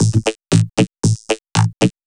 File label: Processed Perc